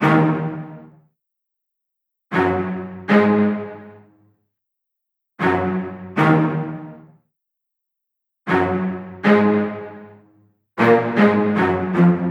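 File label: 078 West Coast Hip Hop Big Pizz Eb minor, Strings; Pro Tools Essential Loops; Digidesign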